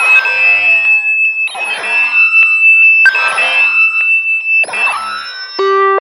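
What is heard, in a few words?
Interference, Hard & Loud Techno; USB Soundscan vol.01; AKAI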